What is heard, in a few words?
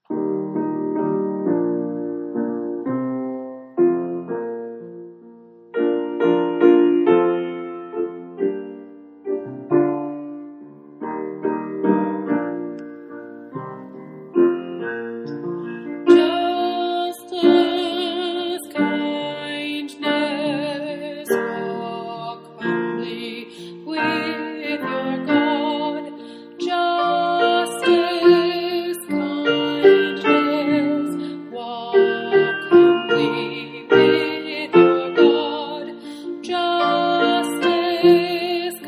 What does the lord require of you Alto Tenor